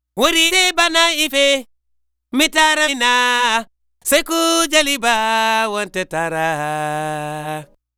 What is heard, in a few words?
African Vocal Double; Continental Drift Aftershocks; Vocals